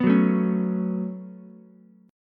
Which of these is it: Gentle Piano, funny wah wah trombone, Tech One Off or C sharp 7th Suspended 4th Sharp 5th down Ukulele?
C sharp 7th Suspended 4th Sharp 5th down Ukulele